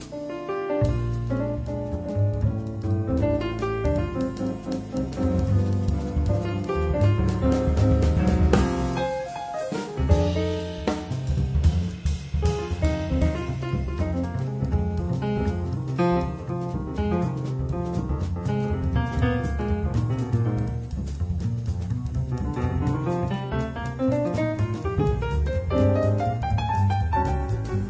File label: Music Box